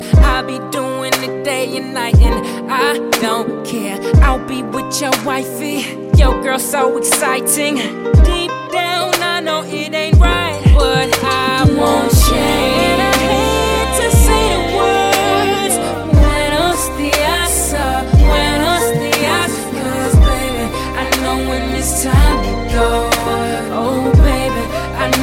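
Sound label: Spanish Guitar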